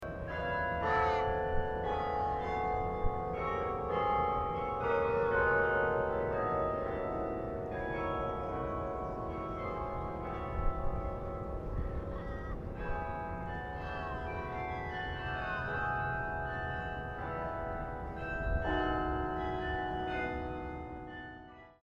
carillon

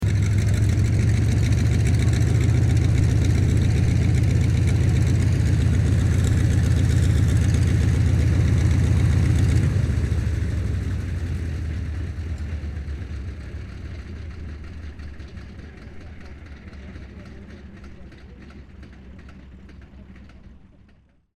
aircraft ca-28 ceres idle shutdown; 1959

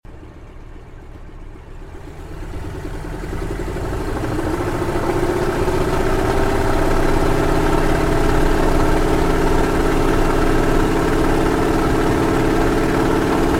aircraft cac wirraway takeoff interior stereo

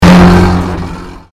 convolution pulse

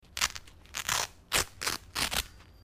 clothing velcro rip sequence

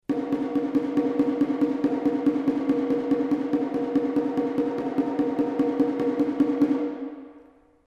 drum taiko shime metal brace sequence

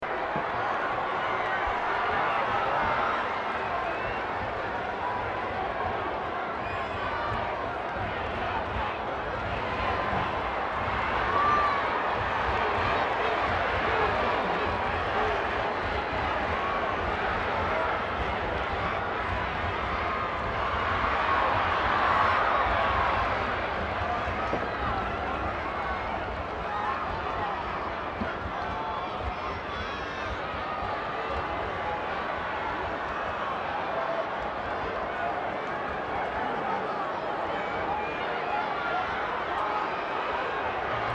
crowd 15k ambience medium loop; rwc

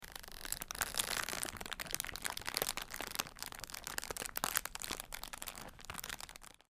cellophane crinkle

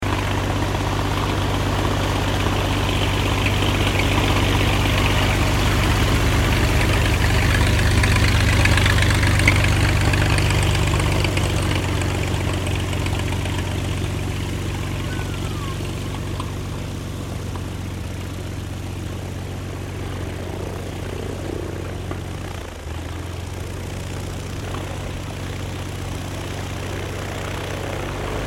aircraft 1953 cessna o-1 birddog shutdown stereo

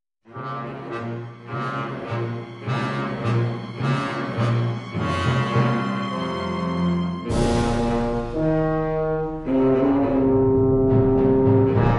storm

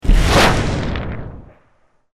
created magic spell fireball stereo

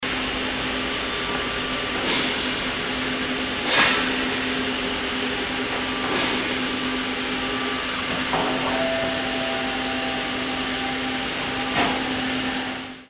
sawmill operating

